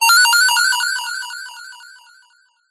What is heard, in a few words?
multimedia game sound positive action tone; zapsplat